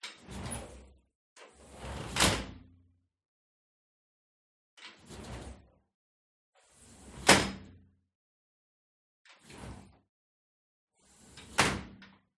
SLIDING DOOR Metal Living Room Open and Close Hard Distant Perspective; gain walkers